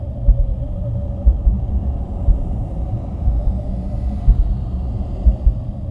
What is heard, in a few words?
wraith idle; Stellaris; guardians